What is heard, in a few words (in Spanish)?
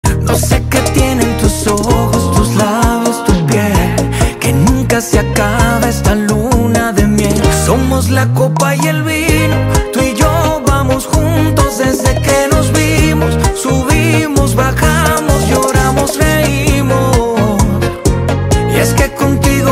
Bailando Bachata